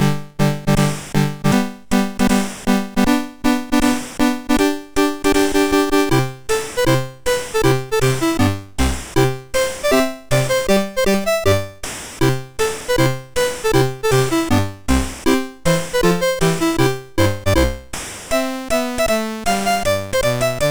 Complete Computer Fireworks Celebration Kit, MOS6581R3